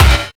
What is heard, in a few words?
XTRA PERC